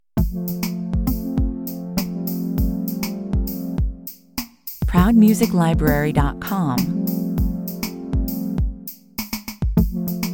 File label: electronic